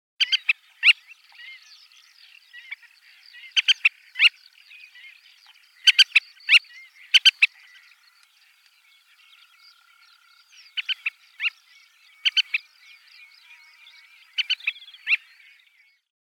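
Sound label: stepnaya tirkushka voice, black-winged pratincole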